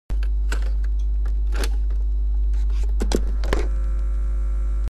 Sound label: Blank cassette tape; نوار خالی